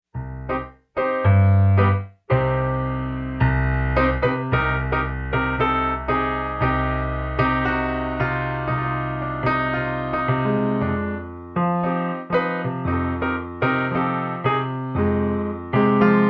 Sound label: Tango Piano